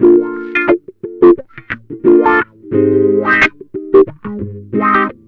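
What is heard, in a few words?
wah funk; Jazzistic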